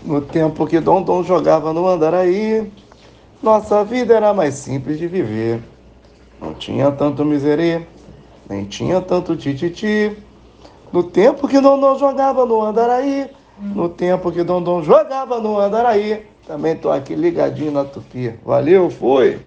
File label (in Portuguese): CANTANDO